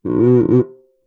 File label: Cuica; Percussion Samples